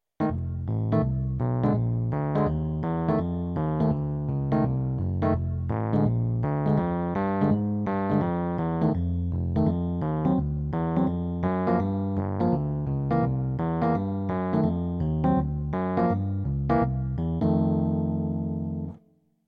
chord

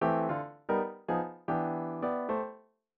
251 voicing, jazz piano

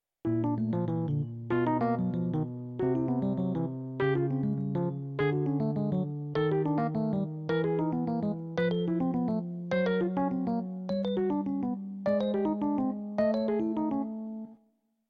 major, jazz piano

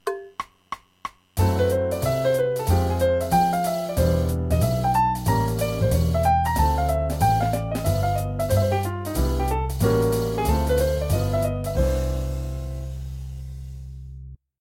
IVm7b5 IVm, jazz piano

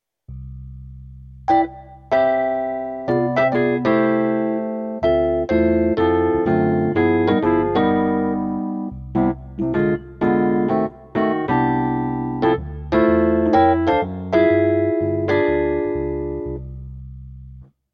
comping, jazz piano

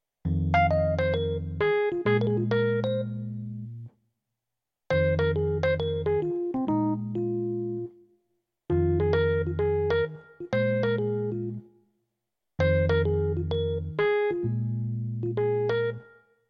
jazz piano

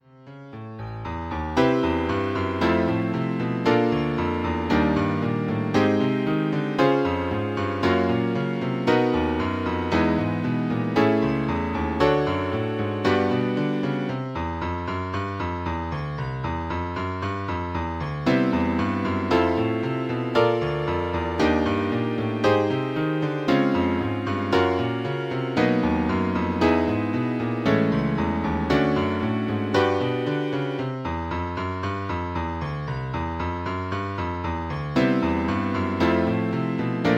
major 7th, jazz piano

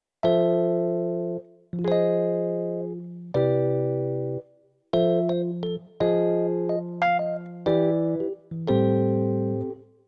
IVm7, jazz piano

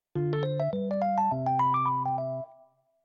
jazz piano

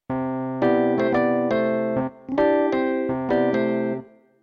resolve M7, jazz piano

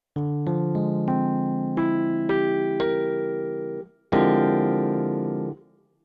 tension, jazz piano

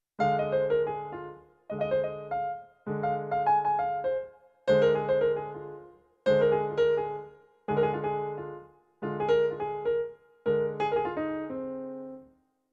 minor penta, jazz piano

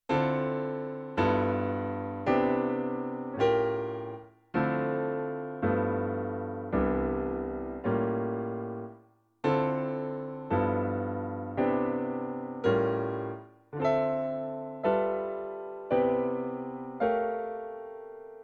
spread, jazz piano

